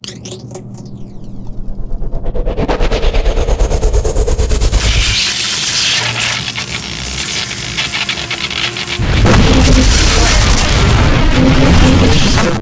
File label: chem fail; Spider Man 1; XBOX